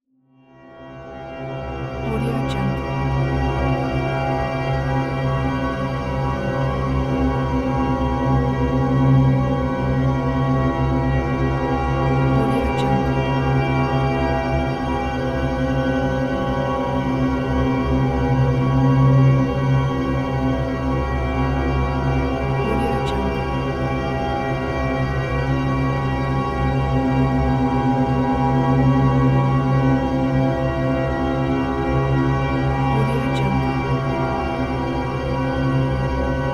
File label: cathedral bell drone